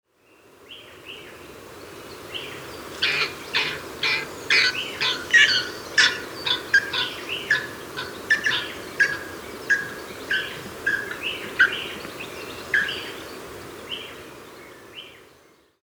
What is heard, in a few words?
Tasmanian nativehen